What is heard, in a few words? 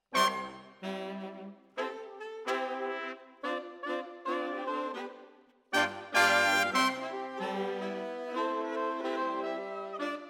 Brass Over